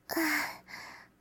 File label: light breathing; ROBLOX GAME ASSETS